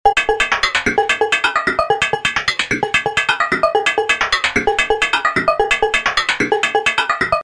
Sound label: loop